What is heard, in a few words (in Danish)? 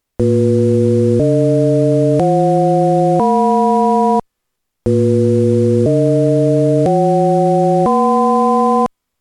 Skala Alm Eller Strech Partial Strech